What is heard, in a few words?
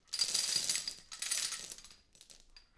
chain movement; DeadFright